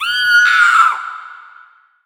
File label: noise shriek female